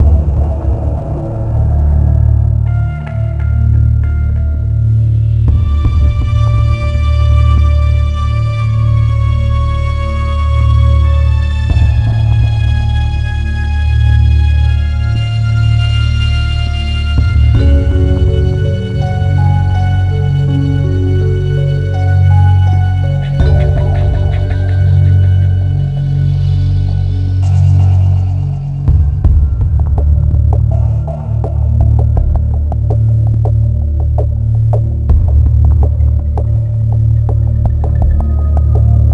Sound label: Suspense Chill Loop